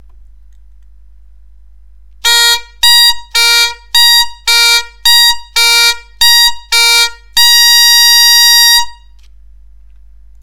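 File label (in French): Arpège si grave si aigu, bombarde; Si/g Ré Fa Ré Si/g Ré Fa Ré Si/g Si/a Si/g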